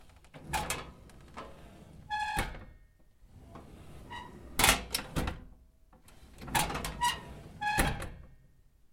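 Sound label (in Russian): открывание и закрывание металлической корзины отходов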